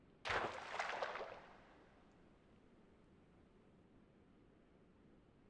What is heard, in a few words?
Footstep Water